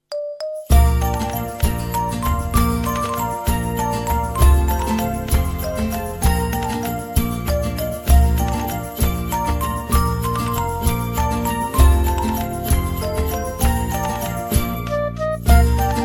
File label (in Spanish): Dime niño instrumental